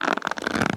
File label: Hub-Train Strap Squeak